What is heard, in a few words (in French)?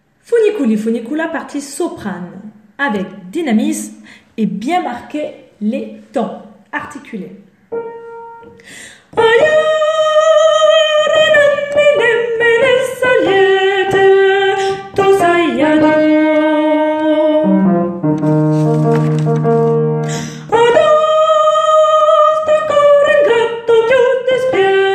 soprano; Funiculi